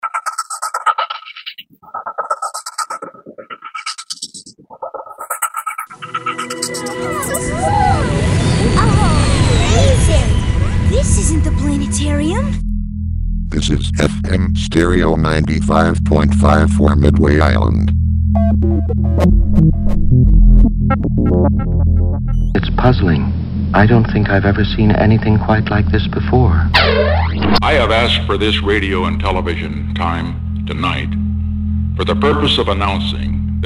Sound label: Jingle